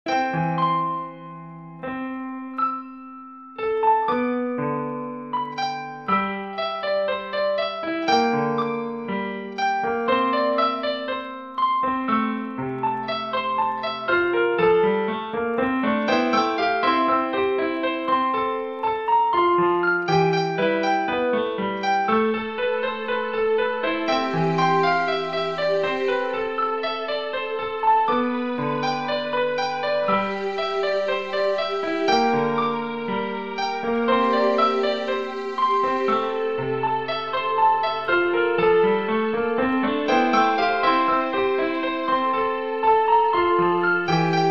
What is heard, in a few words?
piano path